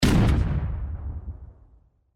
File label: Shoot; Age of Empires III; cinematics